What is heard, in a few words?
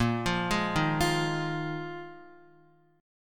Bb 7th Suspended 4th Bb Guitar 4E,4A,5D,5G,5C,6F; arpeggiated